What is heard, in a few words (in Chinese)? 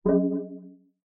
生成